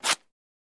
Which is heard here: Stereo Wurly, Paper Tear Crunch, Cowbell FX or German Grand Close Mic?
Paper Tear Crunch